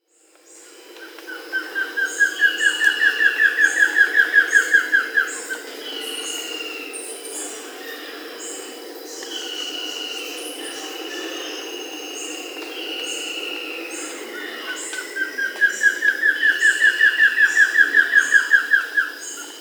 Buff-throated Woodcreeper (Xiphorhynchus guttatus), Peru; S. America